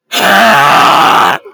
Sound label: weird kid screaming